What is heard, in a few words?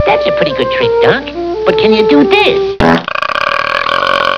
fart